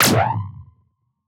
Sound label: weeee